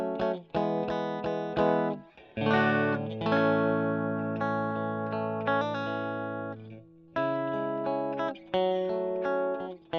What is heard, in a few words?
E. GTR Gefell